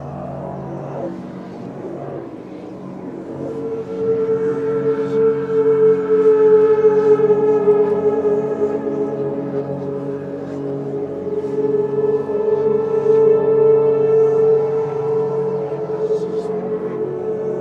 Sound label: Lich Loop; underworld ascendant